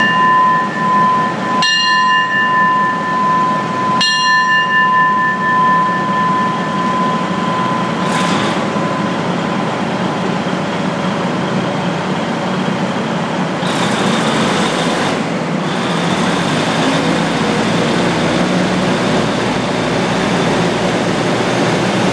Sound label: station bell at lopburi